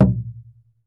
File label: PUEBLO, American Natives, Earth Tones